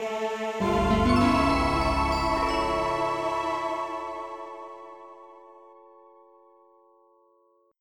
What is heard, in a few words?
eerie; indydesk